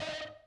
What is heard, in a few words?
techno pizz